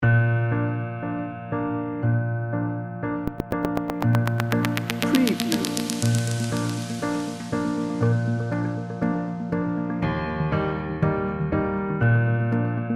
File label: hopeful and heartwarming bed